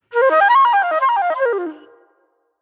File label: Soul-Jazz Flute, Call And Response